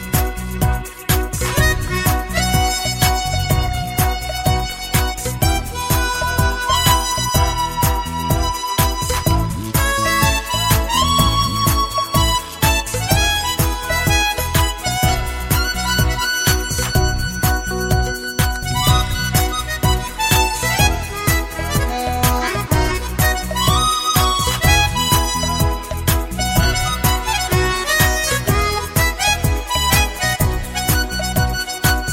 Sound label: Vintage Pop